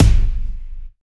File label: big room kickdrum sample g key, Royality free kick drum sample tuned to the G note.